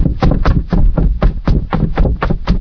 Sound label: footsteps stairs; materials; Garry's Mod; hl2